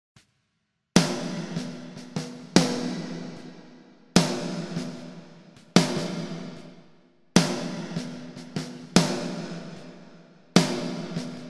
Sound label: Snare Echo